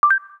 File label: save, system; Samsung SGH-T369 Linx